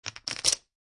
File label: Poker Chips Stacking